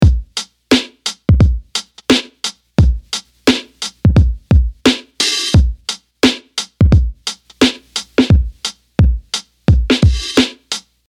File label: podcast beat; vegas